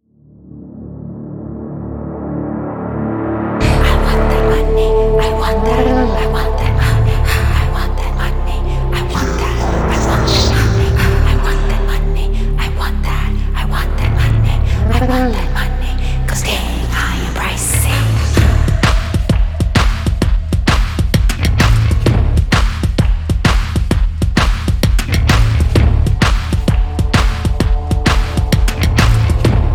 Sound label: Scream Of Darkness Theme; Tamil 2020 Songs; Chakra